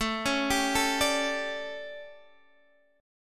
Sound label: A 11th A down Guitar Half Step Down